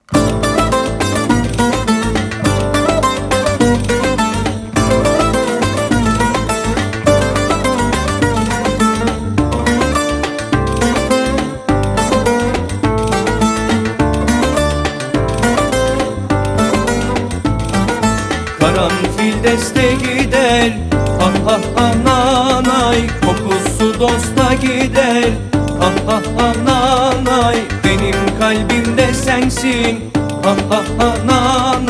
karanfil deste gider erk vocal; by burak sound bank; anadoludan selam var vol 16; turkish anonimler; kilis

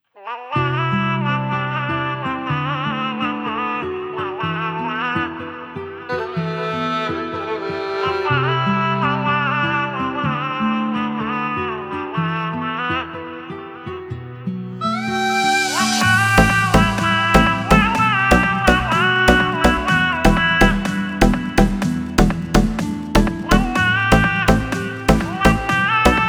arabesk oryantel; by burak sound bank; arabesk oryantel vol 3

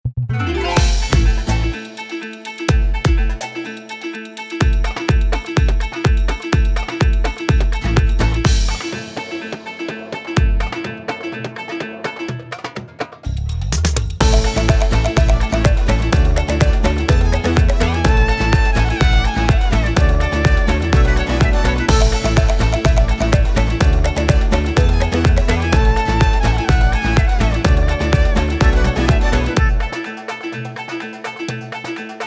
alaturka; by burak sound bank; alaturka vol 1

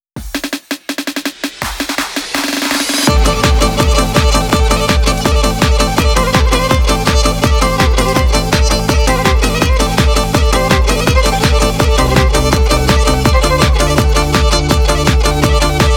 karadeniz dik horon kemence; by burak sound bank; anadoludan selam var vol 1

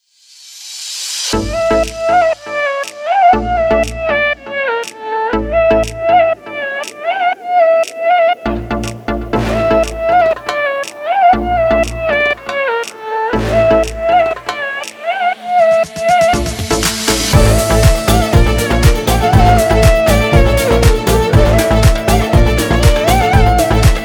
arabesk oryantel; by burak sound bank; arabesk oryantel vol 2

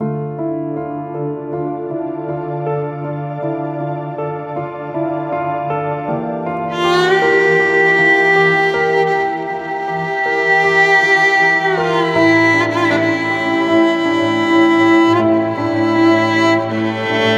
dramatic keman solo; by burak sound bank; dramatic dram vol 1

